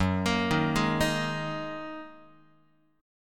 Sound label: F sharp 6th Add 9th F sharp Guitar; arpeggiated; JGuitar